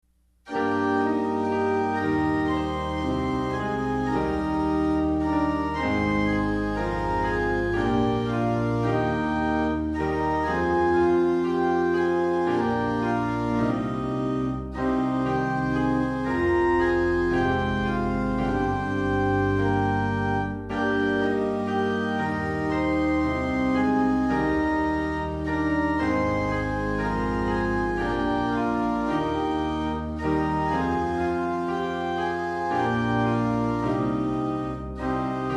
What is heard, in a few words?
SDA HYMNAL SONGS TUNE